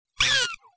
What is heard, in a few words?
Prairie Dog